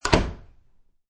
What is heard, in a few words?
Door Close Wooden Door, Household, Door Close, Wooden Door; Miscellaneous Doors